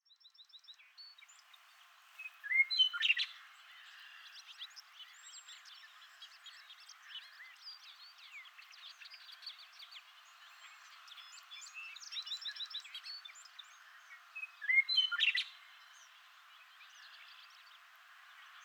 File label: Western Meadowlark Songs